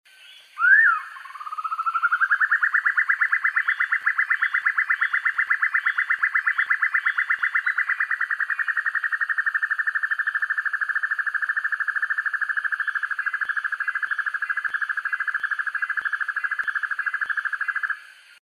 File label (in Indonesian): suara sempur hujan rimba